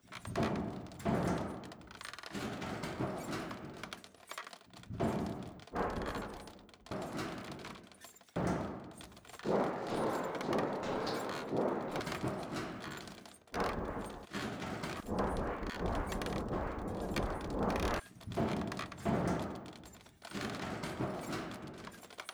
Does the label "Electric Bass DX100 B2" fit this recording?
No